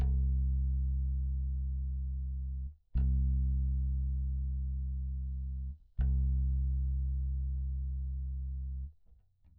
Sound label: bass